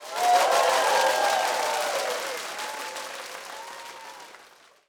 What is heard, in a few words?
crowd Clap; marble race